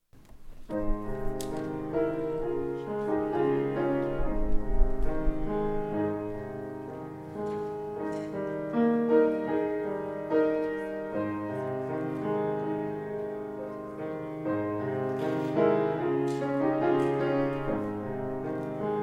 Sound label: pass me not piano